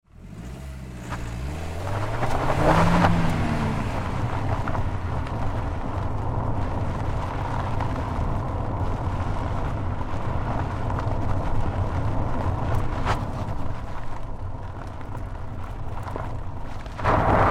Car Tire Onboard Gravel Dirt Roll Fast Skid; Cars

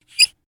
Squeak Handle Turn, Single, Metal; Texture; Squeak Sound Effects; BlastWave

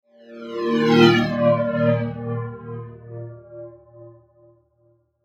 Whoosh Low Flange Delay; Blastwave; Whoosh Sound Effects

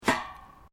Footsteps Boots Grill Metal Step

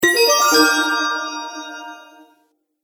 Bright Chimes Stinger; Musical

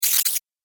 Squiggle Game Fx, Video Game Sound Effects

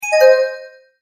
multimedia tone, Goodbye; Tones (Sound Effects:Sound Design); McKinney Sound

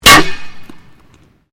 Glass pane impact no break; Material components for constructing more complex sounds

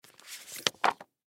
Magazine Set Down; Magazine Single Set Down Magazine; Foley

